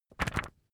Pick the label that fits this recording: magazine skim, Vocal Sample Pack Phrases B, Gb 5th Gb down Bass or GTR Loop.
magazine skim